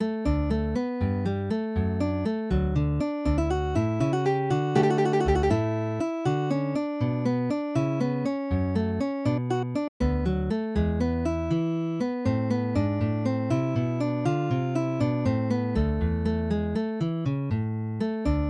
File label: cello suite gigue; bach